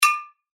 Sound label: PERC D# Banana Bell, percussion; revealed percussion vol 3